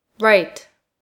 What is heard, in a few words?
correct